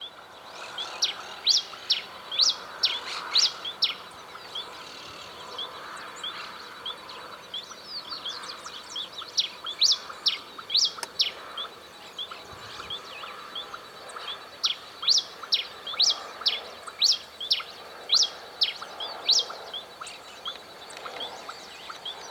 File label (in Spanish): brasita fuego voz; aves